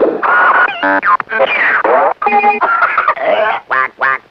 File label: clowns